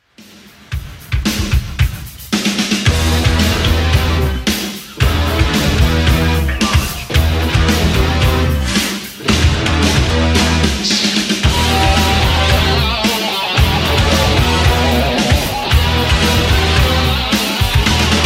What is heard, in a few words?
80s Rock Redrum 112bpm